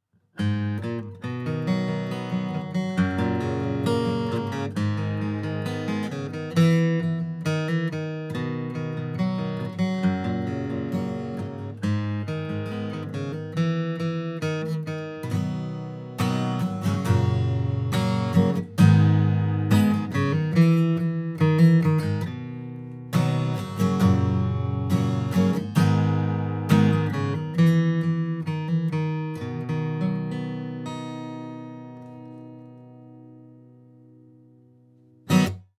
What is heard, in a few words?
guitar; universal audio; dcs